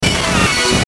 Glitch Musical Tape Rewind, Glitch Fast Hiss Warble Blurp; Production Element Imaging Element Accent Transition; Blastwave